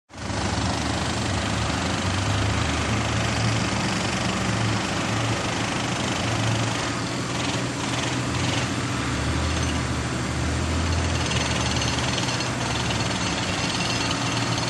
Pnmtc Drill Bull Doze, construction large

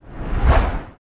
Car Oncoming By Whoo

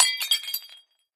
Dish Ceramic Coffee M, housewares

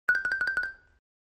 Xylophone Shrt Trill, mallets xylophone

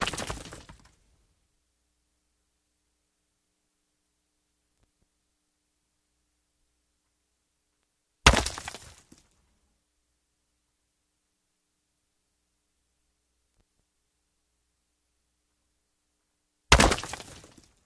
Rock Cracks Big Sharp; rocks & dirt

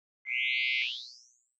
Beep Delay Read Out; beeps

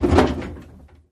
Metal Impact Shake

